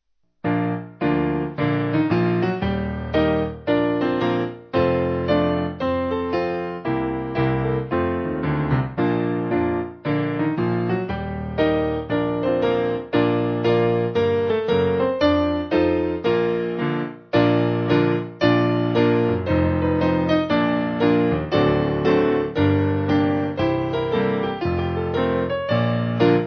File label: Sound The Battle Cry, Sherwin, piano